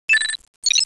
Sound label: beep; Babylon 5 - Entertainment Utility